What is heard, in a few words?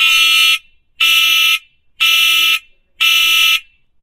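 relaxing alarm clock loop; Source Engine; Portal 2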